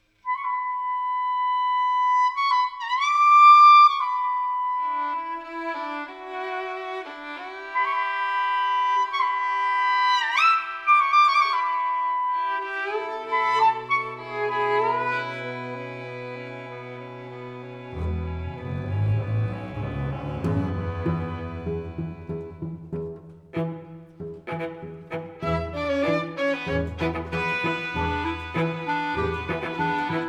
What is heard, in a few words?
spring session stereo mix